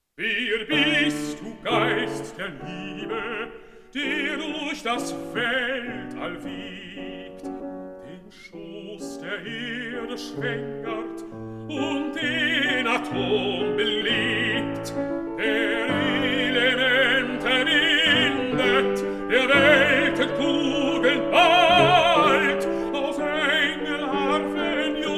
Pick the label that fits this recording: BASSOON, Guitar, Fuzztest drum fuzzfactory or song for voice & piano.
song for voice & piano